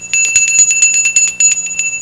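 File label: dinner bell; casa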